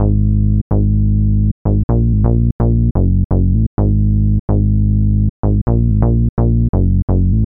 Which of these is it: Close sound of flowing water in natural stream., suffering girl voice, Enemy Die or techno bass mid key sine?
techno bass mid key sine